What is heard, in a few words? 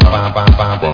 pop rythem